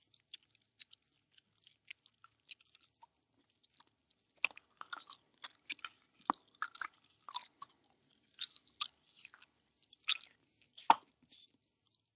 Eating Soft, Eating, 1 child, chewy food or gum, human voice, kid